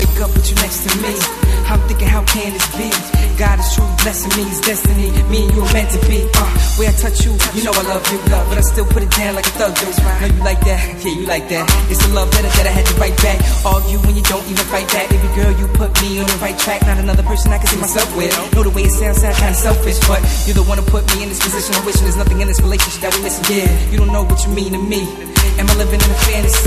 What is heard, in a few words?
remix, hip hop ringtone